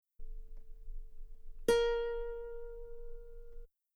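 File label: guitar A sharp 2 very long forte normal; bananenkeyboard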